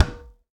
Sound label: Tennis Racket Ball Hit Hard